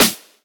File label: Claps & Snares